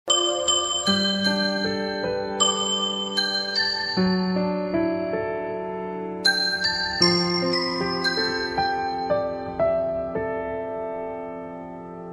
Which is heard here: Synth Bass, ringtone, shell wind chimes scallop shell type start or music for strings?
ringtone